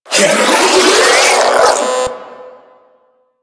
Spin Scream; Enemies; Abilities

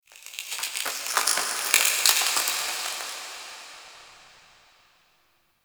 Crypt Stone Crackle A; Ambience; One Offs; Champion Crypt

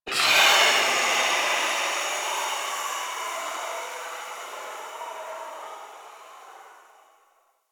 Gas Steam Release; Warframe; Corpus Gas City

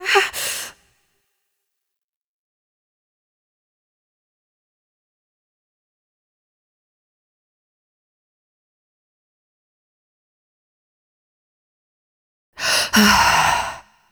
Kiss Breathing; Warframe; The New War; Throne Room

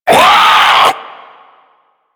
Infested Ancient Pain; Enemies; Warframe; Puss Ancient

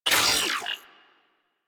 Ship Advert Hologram Off; Ambience; Props; Warframe; Corpus Ship Remaster

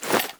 Foley Action Default Melee Swipe

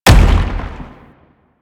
Biped Step; Warframe; Man In The Wall

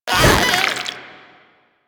Proto Infested Runner Jump Whoosh; Warframe; Abilities

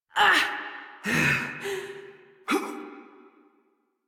Death Adult; New War; Adult Operator

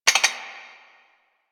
Mug Clink; Warframe; Quests; TheNewWar; Ambience; Zariman

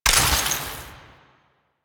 Ammo Pickup Corrosive; DE; Warframe; Warframes; Frumentarius; AmmoAbility

